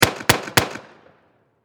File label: Warfare Pistol 45 Magnum Triple Shot Close Perspective; Pistols